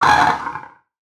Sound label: damage, fractal guardian; Legacy